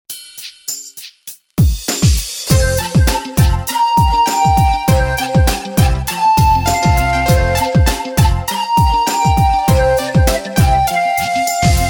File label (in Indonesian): Instrumen Minus One Nada Tinggi